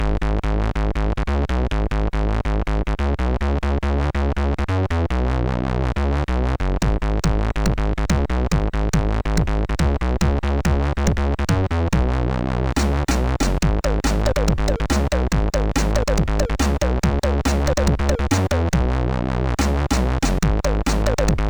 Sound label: Funky, sid MOS6581R3